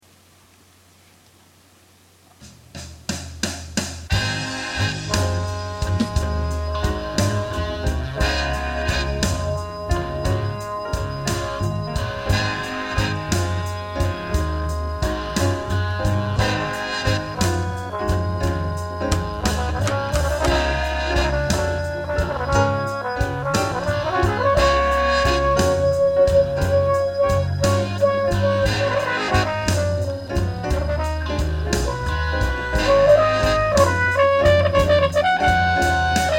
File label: Slow Blues Trumpet